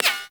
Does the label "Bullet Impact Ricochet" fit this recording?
Yes